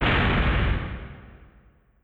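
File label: Collision Exterior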